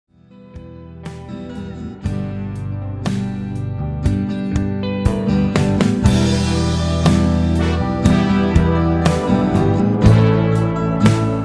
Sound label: wo Vocals Sample